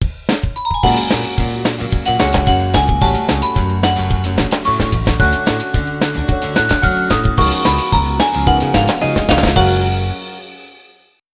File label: BGM Cute